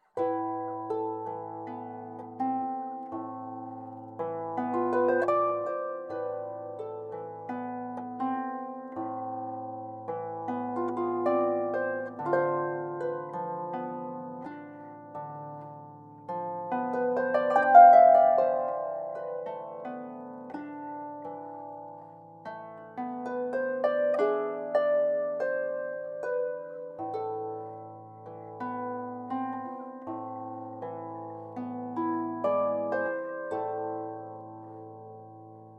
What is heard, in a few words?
lacrim sample, harp